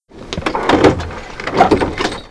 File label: coaster creak; Left 4 Dead 2; random amb sounds